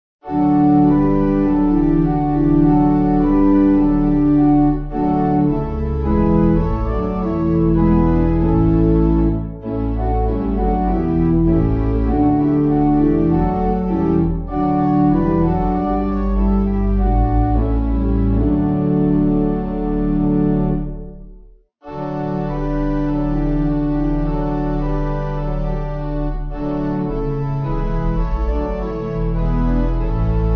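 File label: Sicilian Mariners Pipe, Pipe Organ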